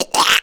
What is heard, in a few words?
PSCHWACK, VOICE; Rarefaction - Poke in The Ear With A Sharp Stick